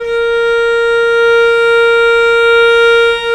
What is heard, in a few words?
A#3, VIOLE, Historical Instruments; USB Soundscan vol.23